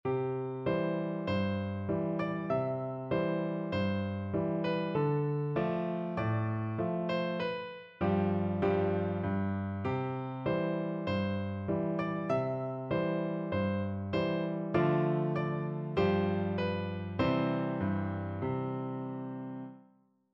muffin man, piano duet

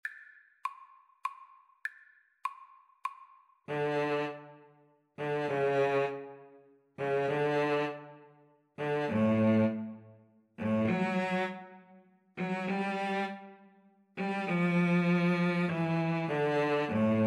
Oboe Cello Duet

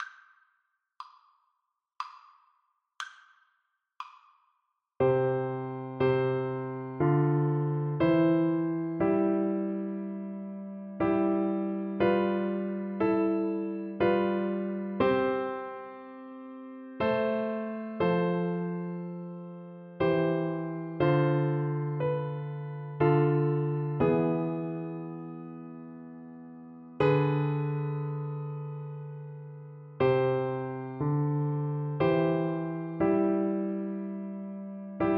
flute

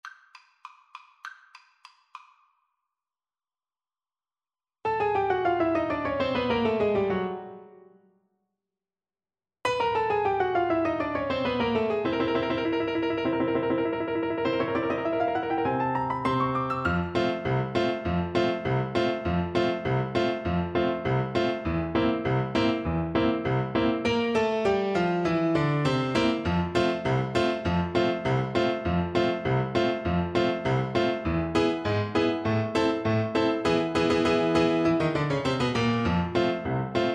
French Horn

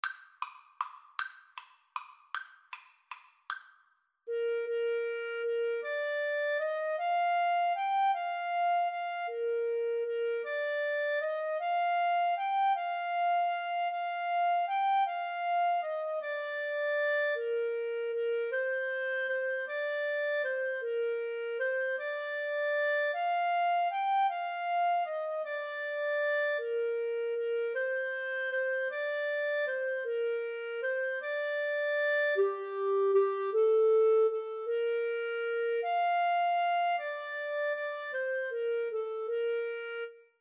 Clarinet Duet

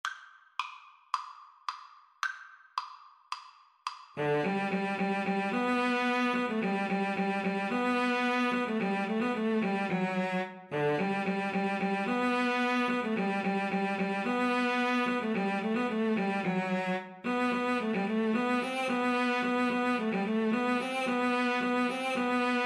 Cello Duet

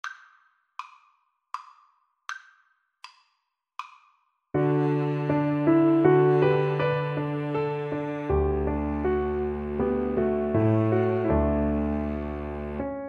Piano Trio

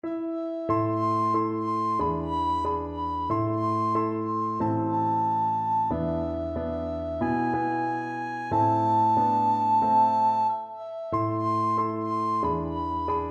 recorder

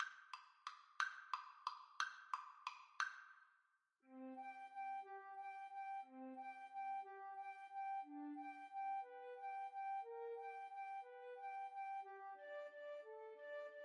waltz, Flute Duet

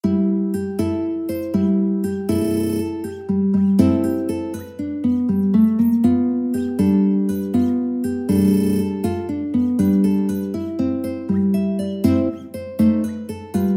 mandolin

